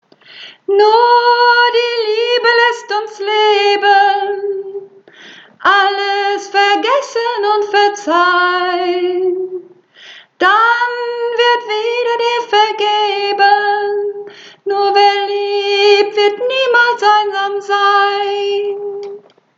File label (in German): Sopran, Uebungsaufnahme